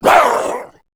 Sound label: zombie vox male pain; tf; slender; rave in the redwoods